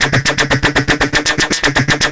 SH Loop Ring

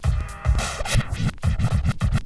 scratch

